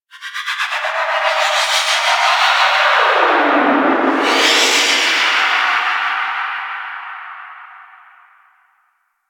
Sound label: Undercroft Shriek Tonal Verb One Off, Ambience; Warframe; Duviri